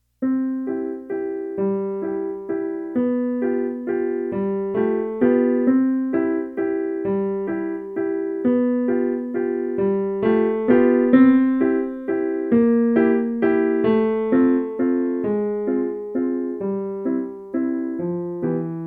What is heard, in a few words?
A Skating Waltz, piano